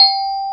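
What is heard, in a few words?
glockenspiel; TOY PIANO; AKAI S6000 CD-ROM - Volume 1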